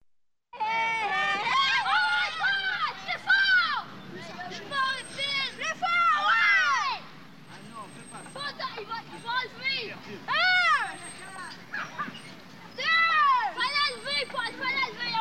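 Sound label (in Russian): французские дети на игровой площадке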